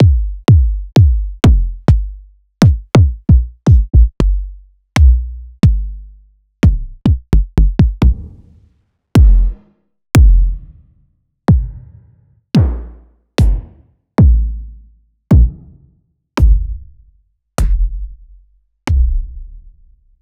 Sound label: kicks